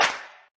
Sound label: Sex Smack